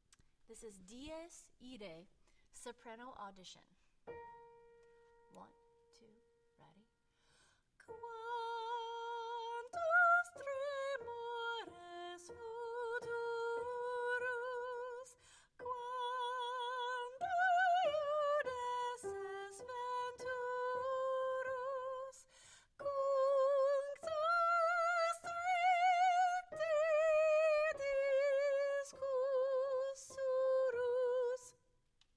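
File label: dies irae soprano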